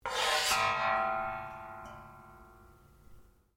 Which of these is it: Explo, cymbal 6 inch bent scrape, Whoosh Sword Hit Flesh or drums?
cymbal 6 inch bent scrape